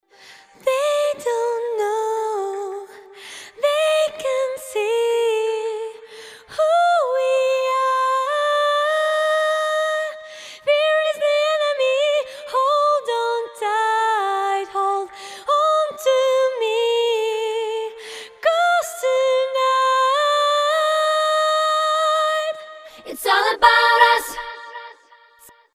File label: Acapella Snippet